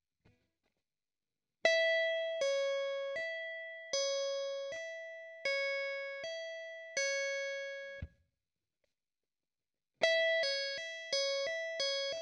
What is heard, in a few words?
Sweep Lick Pull-Off Exercise